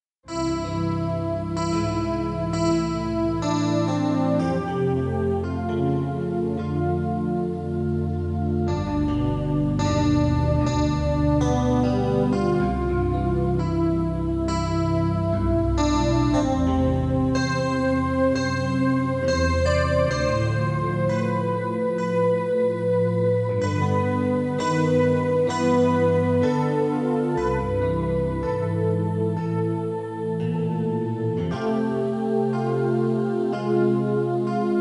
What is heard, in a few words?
little silence